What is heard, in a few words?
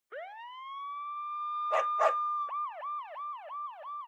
Working Dog Police, cani da lavoro